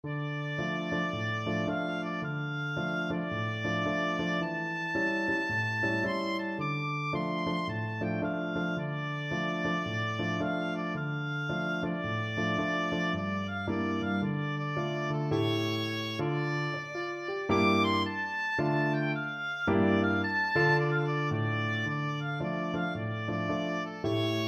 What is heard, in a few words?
wade in the water, oboe